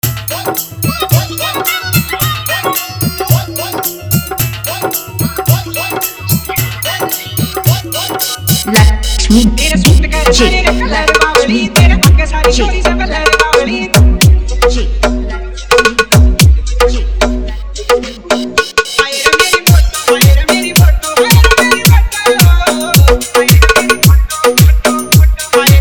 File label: Haryanvi remix song